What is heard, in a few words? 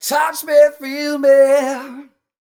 m 128 mel b-flat, Vocals